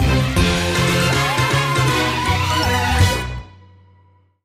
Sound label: outro Free Spin; netent; whenpigsfly